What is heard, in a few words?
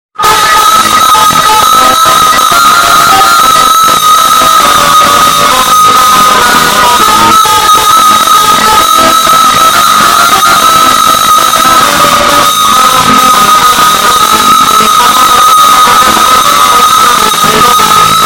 tarantella